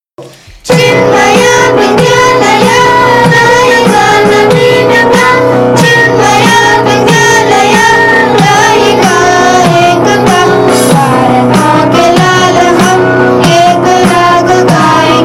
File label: RING TONE; CHINMAYA VIDYALAYA